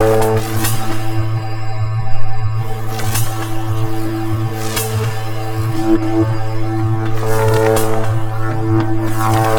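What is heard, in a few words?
chamber lightning; ninjago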